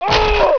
kill; Exile III v1.0

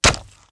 donkey get hit; Immortal

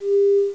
low fuel; Operation Intercept 1.0.1

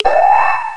valve click; Word Wizard Games

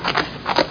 Horses Asterisk; Win95

